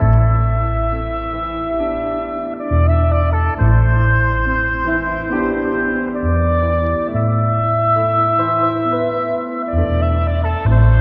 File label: horns, Sad